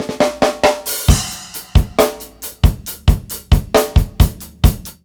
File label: DRUMLOOP, Ragga & Dub Reggae; USB Soundscan vol.27; DRUMLOOPS